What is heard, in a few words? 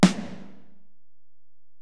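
Acoustic Snare, Alesis DM-PRO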